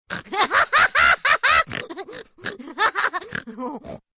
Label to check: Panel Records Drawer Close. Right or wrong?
Wrong